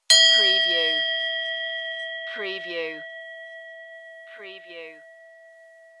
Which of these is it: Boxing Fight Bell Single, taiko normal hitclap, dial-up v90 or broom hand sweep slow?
Boxing Fight Bell Single